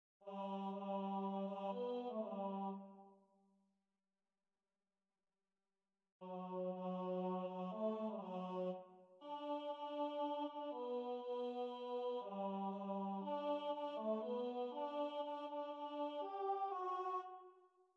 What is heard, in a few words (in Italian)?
coro tenori; Idomeneo